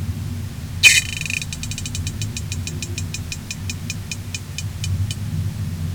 Clupea pallasii

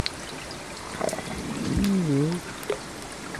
Oncorhynchus tshawytscha Gill Bubble Moan

